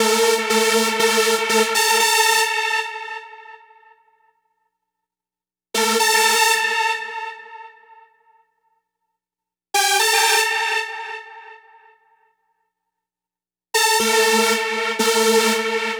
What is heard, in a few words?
Trance Synth